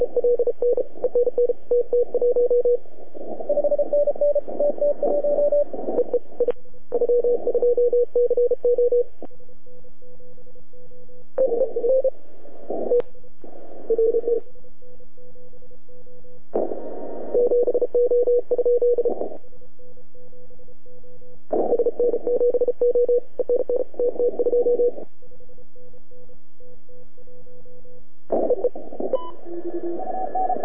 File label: CW 3,5MHz; LY2CO de SN7O; BALTIC